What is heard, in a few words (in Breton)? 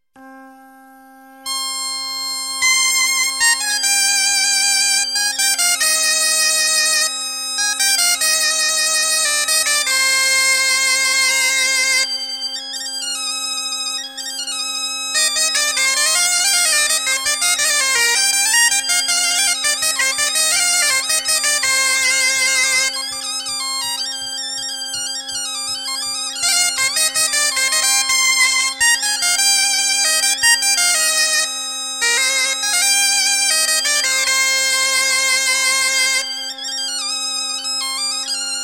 Plinn Bal